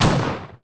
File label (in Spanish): tiro pistola shotgun